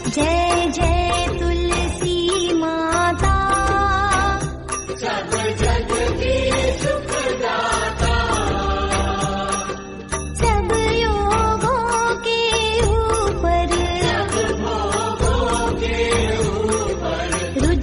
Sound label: Tulsi Vivah Aarti Marathi Ringtones